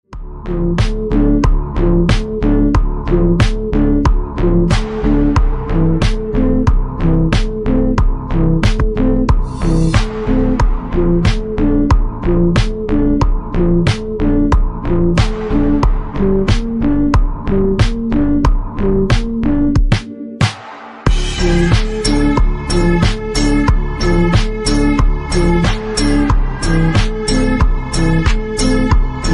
slowed reverb

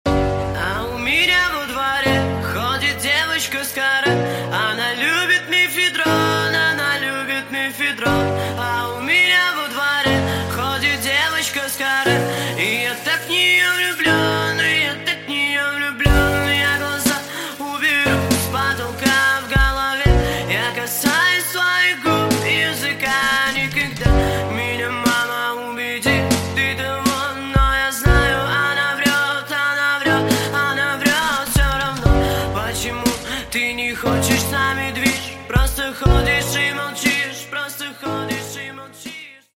piano remix

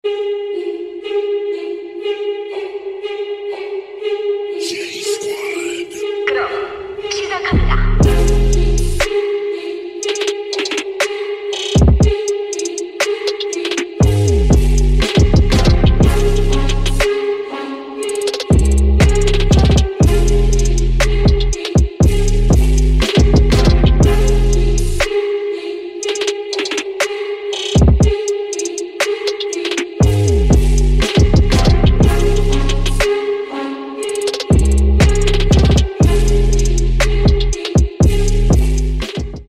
trap remix; Squid Game

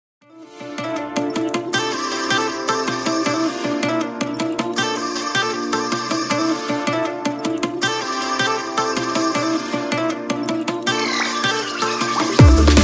instrumental

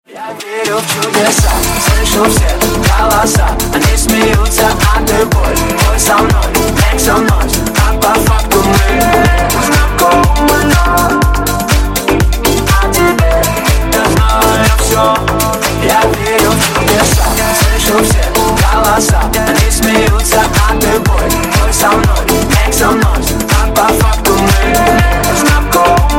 funk remix

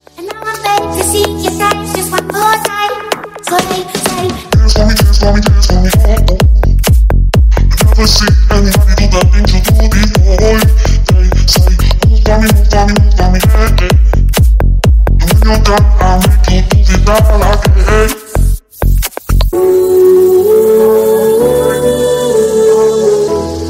remix

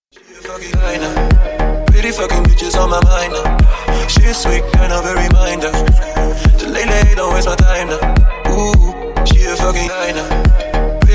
remix

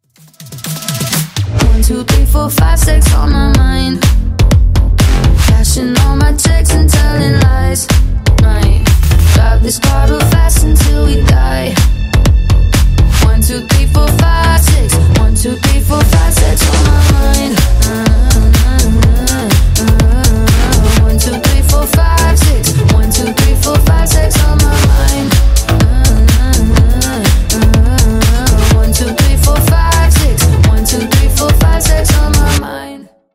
flute remix

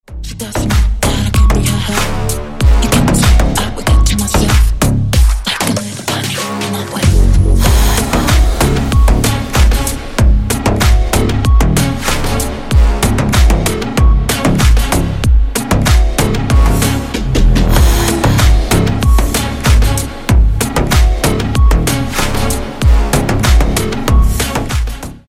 sexy beat

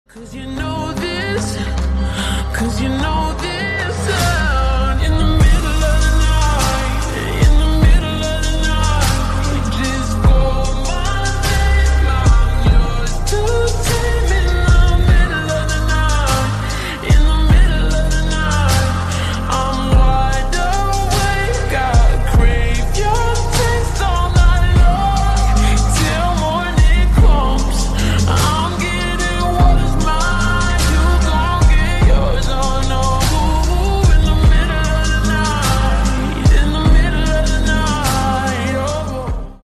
slowed reverb

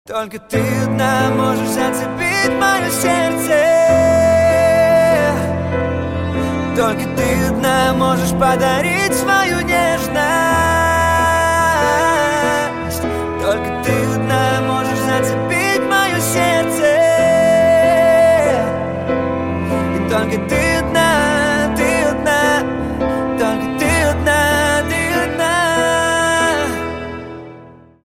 acoustic version

